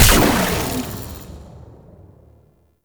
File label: Operators Amp Chassis Part C Fire; Warframe; Amplifiers